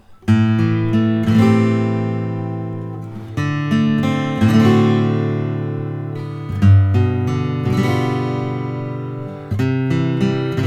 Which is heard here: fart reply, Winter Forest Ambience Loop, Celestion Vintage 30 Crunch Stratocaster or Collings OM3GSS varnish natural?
Collings OM3GSS varnish natural